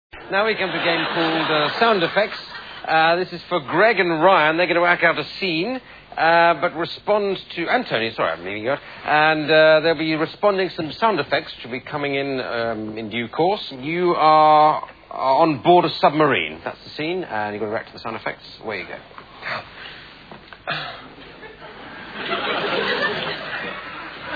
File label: Sound Effects Submarine; Whose Line Is It Anyway?; Tony Slattery, Ryan and Greg, in a submarine, react to sound effects that are played in.